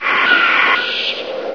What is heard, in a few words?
attack; hl1; controller; Source engine